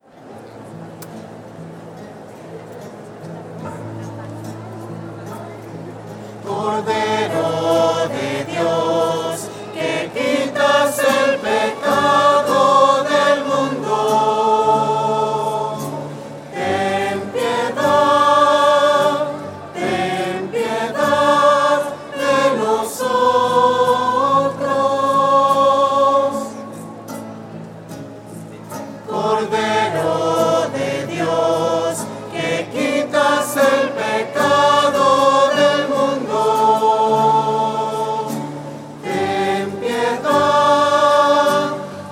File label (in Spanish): cordero de dios voces; misas 2012